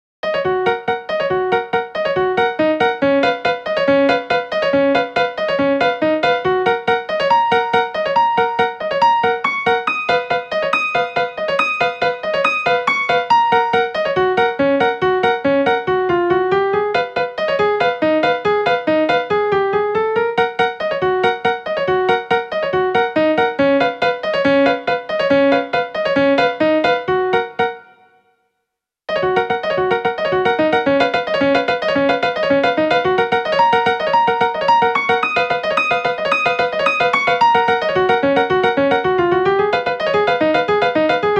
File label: Waltz; The Flea Waltz